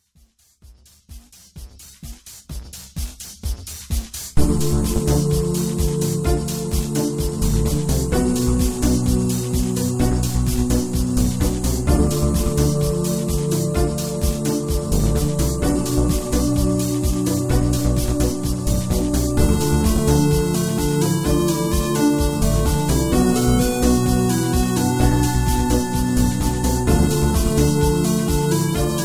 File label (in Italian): pizzicati synth